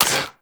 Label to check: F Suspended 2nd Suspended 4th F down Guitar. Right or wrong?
Wrong